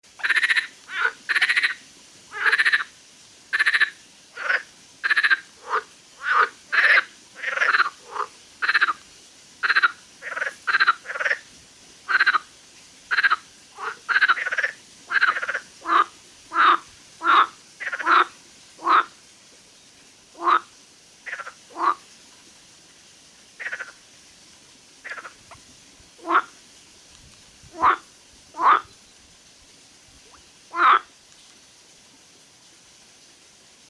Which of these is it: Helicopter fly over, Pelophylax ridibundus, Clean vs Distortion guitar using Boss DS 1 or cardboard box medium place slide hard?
Pelophylax ridibundus